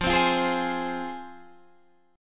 F Minor, F, Guitar, Standard; Fm strummed; 13,15,15,13,13,16; JGuitar

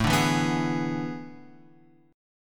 A Major 7th Suspended 2nd Suspended 4th; AM7sus2sus4 Chord {5 5 2 4 X 4} strummed

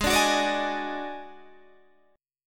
Eb Minor Major 7th Double Flat 5th

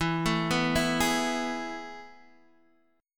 A Major 7th Suspended 2nd

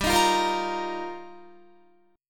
G Minor 7th Sharp 5th, Guitar; Gm7#5; strummed; 15,18,15,15,17,17

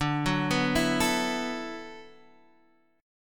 D 6th Add 9th D, Guitar, Half Step Down

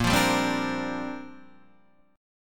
A sharp 6th Add 9th, Guitar DADGAD

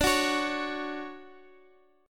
D-Major 7th, D, Guitar, Drop D

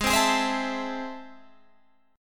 A Minor Major 7th, G sharp

